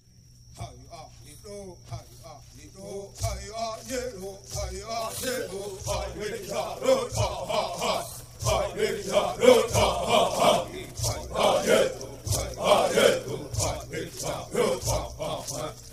Canto de iniciacao Waptee

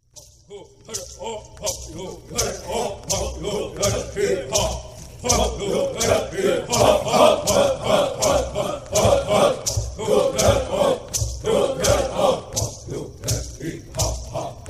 Canto de luta dos Wapte

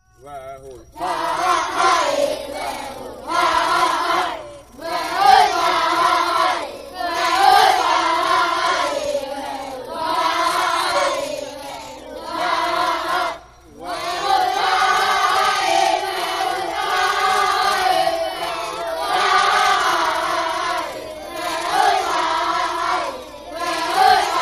Canto dos Wapte

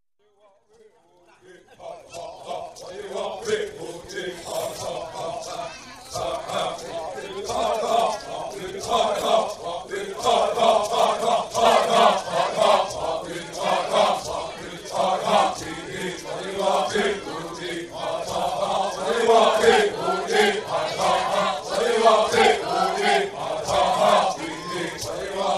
Canto para animar a aldeia

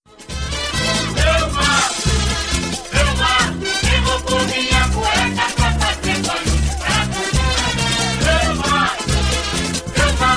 marchas de carnaval

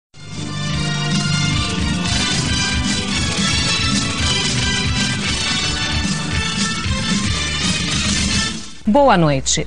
vinhetas; boa noite; jornal nacional

